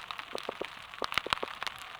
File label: knock series, soniferous; Gilligans Island